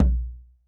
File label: Tom Acoustic Warm